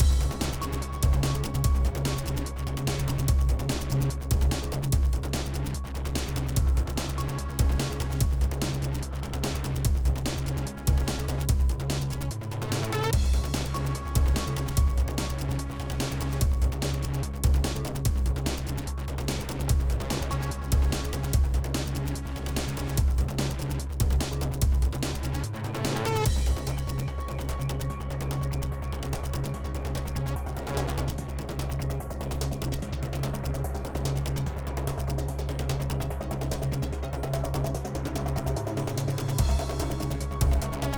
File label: Combat 8CH 146 25BPM